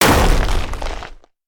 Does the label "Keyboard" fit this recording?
No